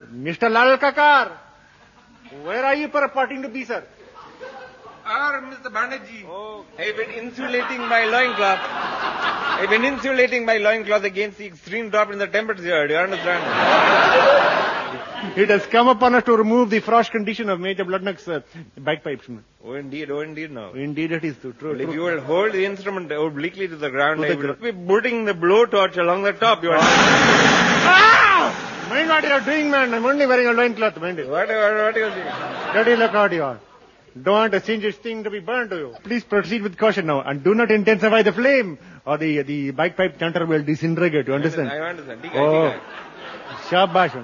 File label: bagpipes